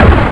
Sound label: big psionic; Odyssey